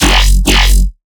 Riddim Bass Hit F#; Slippery

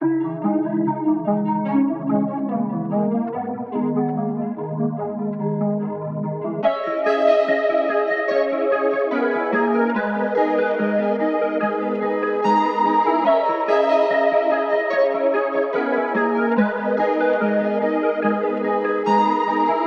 145 BPM G# Min, Hip Hop Melodies; Tantra

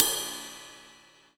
Top Shuffle, Drums; One Shots; Ghosthack; Drum Mega Bundle; Abstract Drums Volume 2

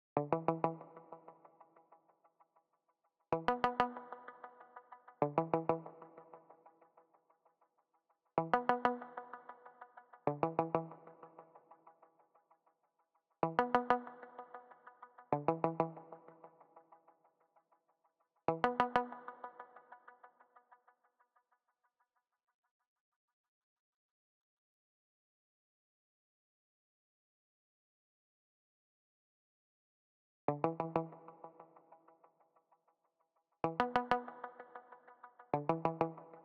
95 BPM F# Min Pluck, Latin; Cymatics; PARADOX II; Melody Collection; Amazon

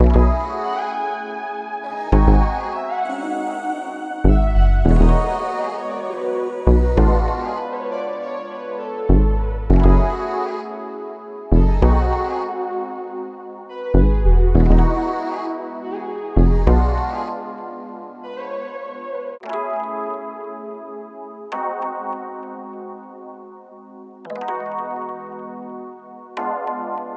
99 BPM C# Min, Vintage & RnB Melodies; Monogram; Cymatics

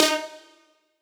Riddim Melodic Hit - D# Cruncher; One-Shots; Ghosthack; Riddim Essentials Volume 2

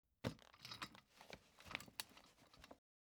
Metal Chair Roll; Fairlight Sound Lib

